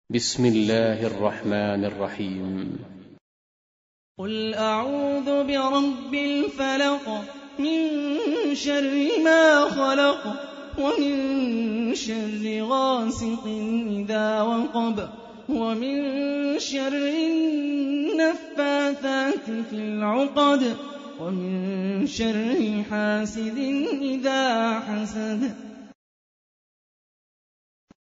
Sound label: Quran; Hani Refaey